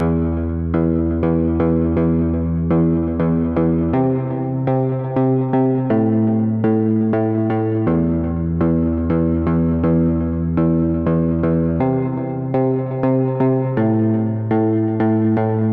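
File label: 122 BPM Riffs C major A minor Tonic E; mmb studio soundtrack essentials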